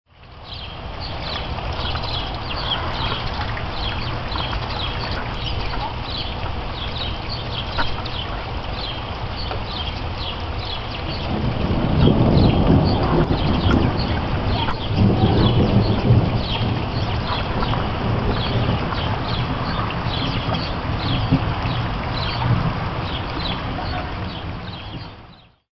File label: Birds And Thunder